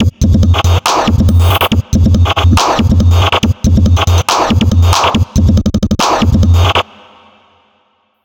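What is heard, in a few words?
distorted loop, Dubstep; Dubstep Sample Pack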